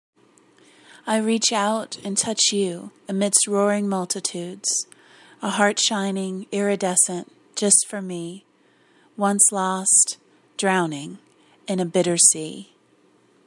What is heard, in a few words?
roaring multitudes